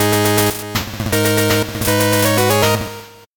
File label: 8bit jingle fast